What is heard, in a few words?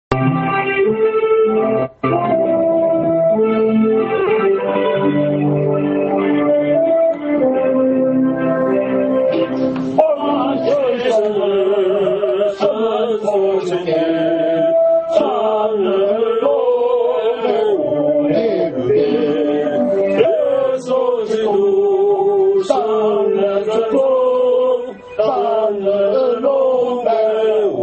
hymn; worship